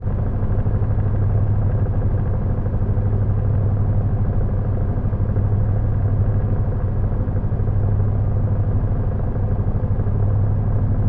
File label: washing machine operation